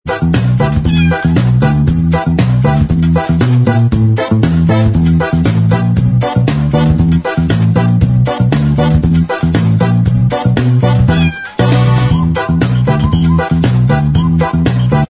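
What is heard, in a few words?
instrumental track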